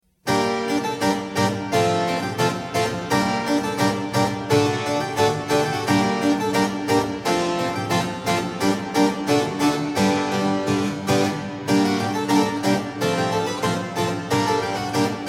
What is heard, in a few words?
harpsichord; A.Valente; Torch Dance